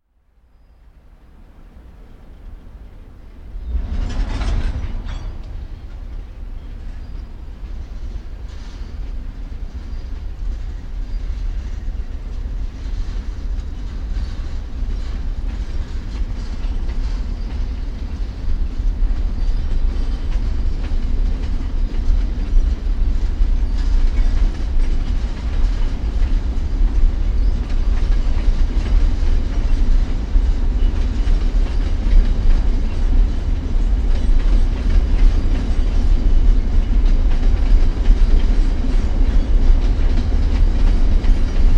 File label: Coal train departing Otira night time